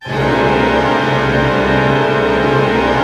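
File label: cluster fortissimo, Orchestra; Sound On Sound - Peter Siedleczek's Orchestra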